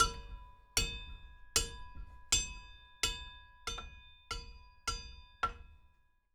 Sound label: Hitting, Workshops